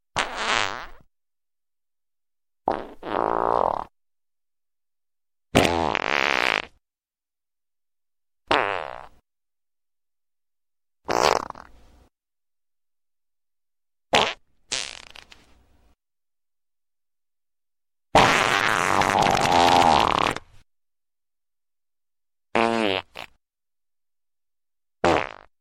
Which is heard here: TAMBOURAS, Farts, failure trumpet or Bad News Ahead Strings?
Farts